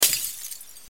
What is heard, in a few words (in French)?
Verre